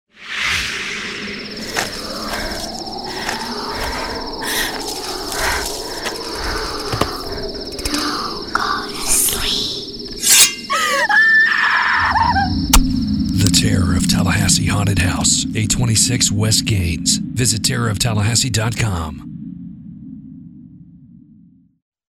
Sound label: wind